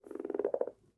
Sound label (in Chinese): 青蛙待机声